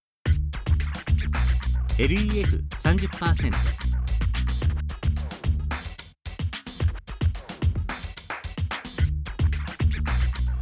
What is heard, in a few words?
hip hop